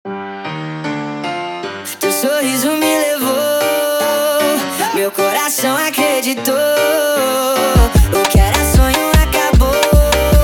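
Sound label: sped Up, phonk music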